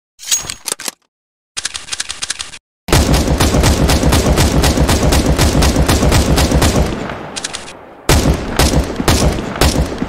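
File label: SCAR-20, CS:GO weapon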